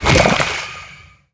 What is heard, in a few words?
fishstick cast; Garry's Mod; sprunki jelly fish